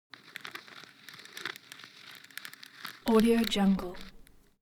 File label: object hygiene floss pull out slow; smartsoundfx